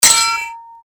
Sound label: sword hit